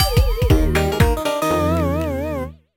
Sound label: Coaster Failure